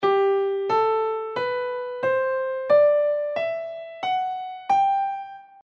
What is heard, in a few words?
Scale degrees